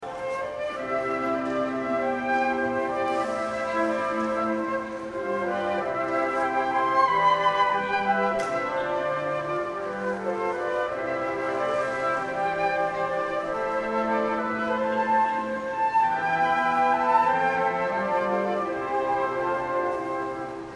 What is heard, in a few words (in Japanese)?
flute; arietta-flute